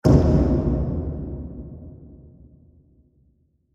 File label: Sci-Fi Impact Blast; Science Fiction Spaceship Slowing Down Transition; CraftedSignals